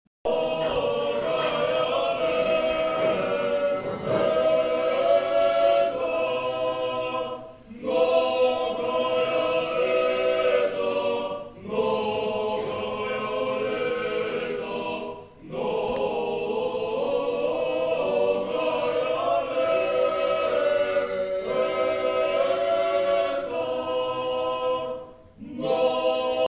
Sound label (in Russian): многая лета